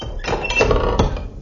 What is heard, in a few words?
Door Latch